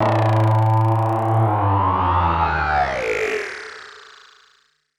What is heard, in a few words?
SPACE FX 140; SpaceSounds